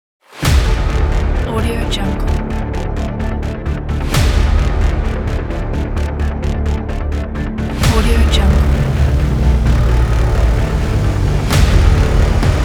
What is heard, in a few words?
impact cinematic intro